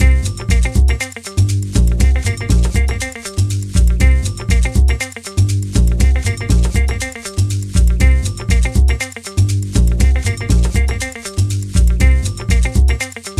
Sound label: LPF 10kHz